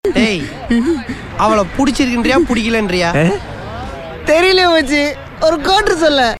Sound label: sms